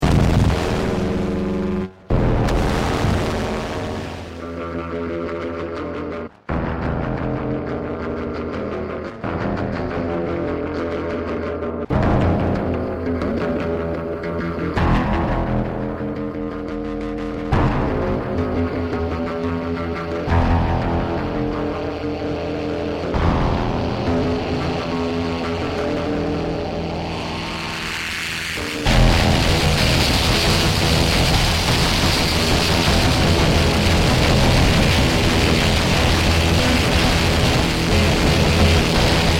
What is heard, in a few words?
industrial; deathbyaudio